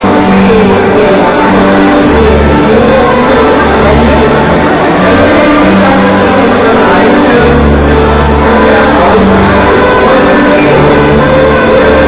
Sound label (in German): Menuettwalzer; Volkstaenze